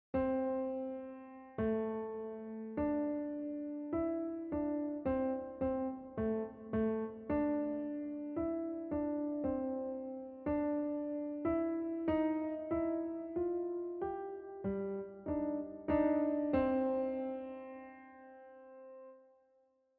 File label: JazzWalk